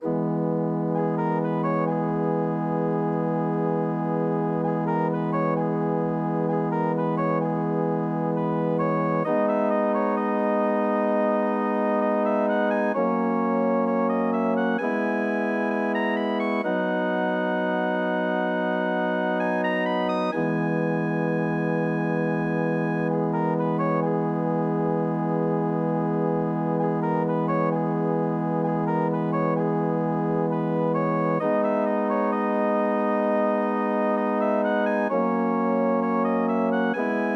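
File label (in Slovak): perinbaba organ, Romantická skladba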